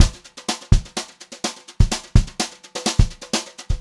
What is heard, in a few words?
JZ BEAT, Brushed Drum Loops; USB Soundscan vol.39; AKAI; 90 s Sample CDs; 126 JAZZY